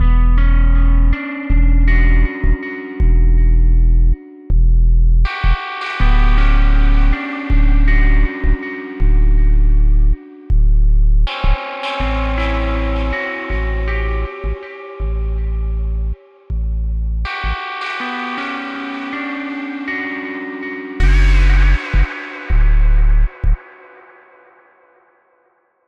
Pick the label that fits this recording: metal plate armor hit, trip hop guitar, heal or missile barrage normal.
trip hop guitar